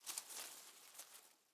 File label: leaf rustle crunchy soft